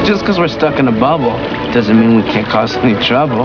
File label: bio bubble